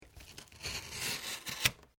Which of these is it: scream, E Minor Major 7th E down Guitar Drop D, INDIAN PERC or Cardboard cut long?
Cardboard cut long